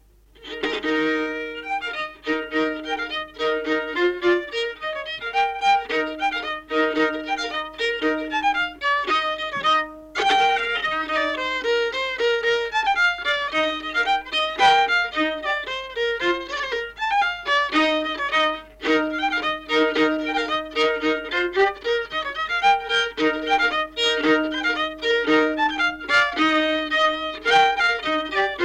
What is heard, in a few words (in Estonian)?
Ruhnu viiul folk